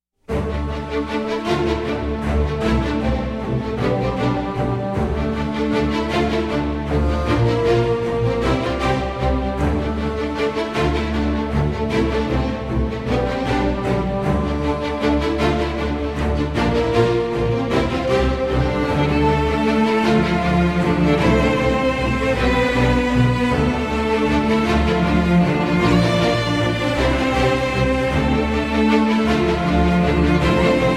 Orchestral

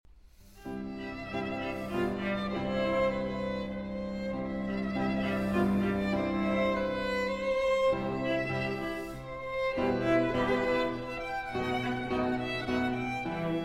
Quartet in C Major, Minuetto, Andante

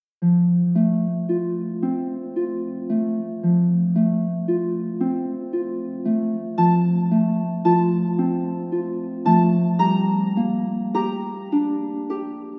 Harp

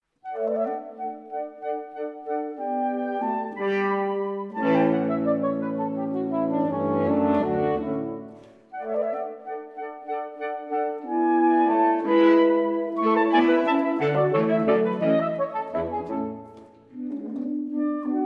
Saxophone Quartet, Menuetto; String Quartet No. 21 in D Major, K. 575; Allegretto

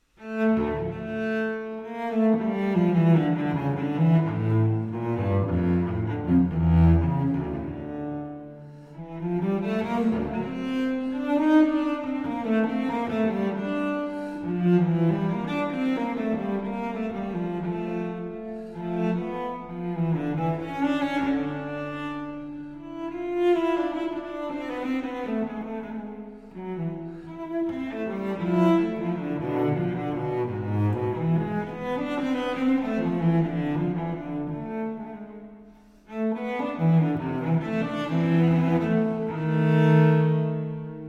Cello Suite in D Minor; J.S. Bach; Cello Suite No. 2; BWV 1008

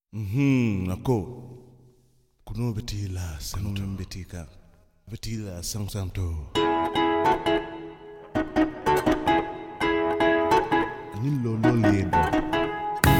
Instrumental Version

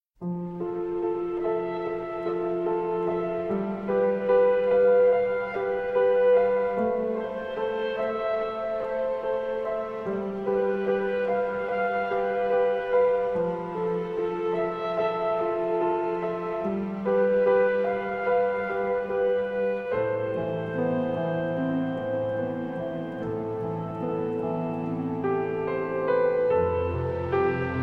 Orchestral; Me Before You

